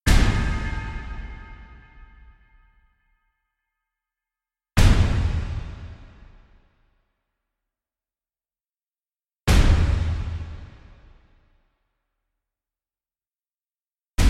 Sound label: synth drums